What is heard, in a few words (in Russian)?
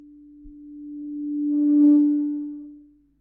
zvuk obratnoj svyazi mikrofona